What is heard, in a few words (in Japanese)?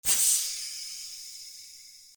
水ロケットの発射音＿弱 B1, おもちゃ; G｜音を出すもの